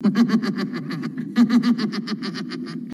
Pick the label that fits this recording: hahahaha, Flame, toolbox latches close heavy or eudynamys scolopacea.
hahahaha